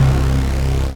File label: RAVE, RAVESYN; Best Service; Dance Mega Jungle Rave